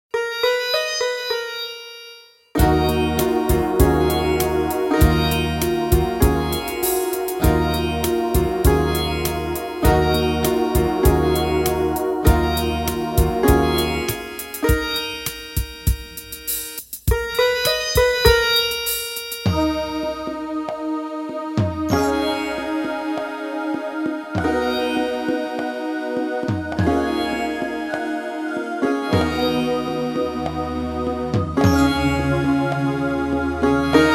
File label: Music Only; 20 Count Breathe